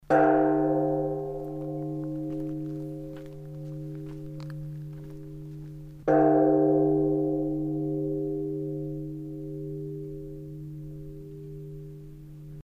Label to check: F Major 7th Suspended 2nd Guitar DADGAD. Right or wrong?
Wrong